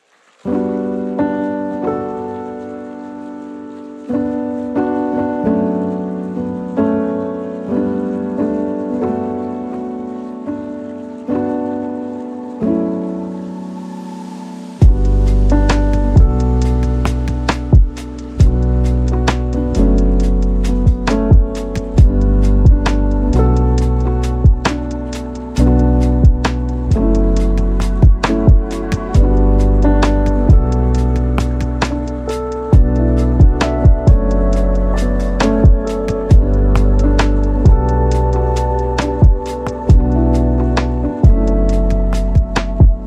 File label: First Light, lofi piano